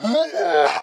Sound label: Kamikaze Coming